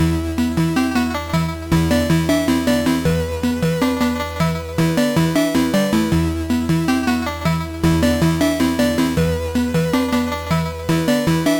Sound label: synth song; MED; Voyager